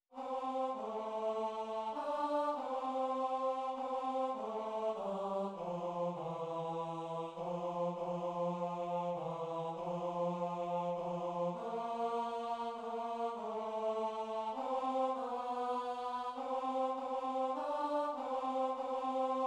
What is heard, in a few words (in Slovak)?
tenor, zborove skladby vianocne